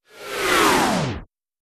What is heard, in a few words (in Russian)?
reklamnij dizajn perehod zvuka